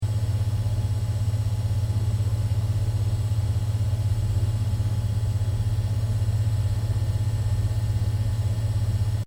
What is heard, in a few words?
Industrial Machine Unknown Hum Loop; Various industrial machines; Machinery